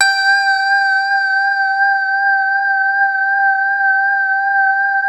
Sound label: KOSM HARD G5; Music Store - Claudius Diemeter Schwerlos; FINE ART 3